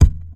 original kick single shot e key; kick drum samples

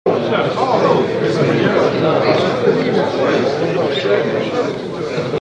PEOPLE MURMURING

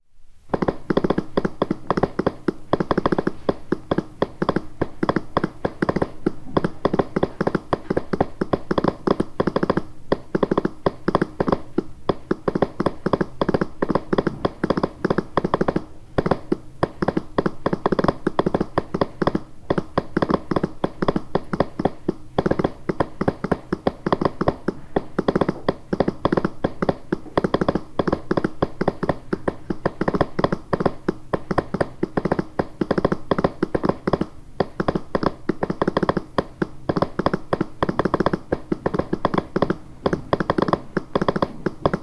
morse telegraph machine key - morse code

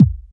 boomy kick drum sound d key

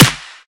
acoustic snare sample c key

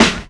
clear snare one shot a sharp key

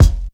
mid range bass drum sound f sharp key

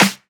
original snare drum sample g key

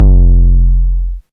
big kickdrum sample f key

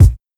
boom kick sound g key; kick drum samples

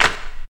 Royality free hand clap sound tuned to the B note; single hits one shots

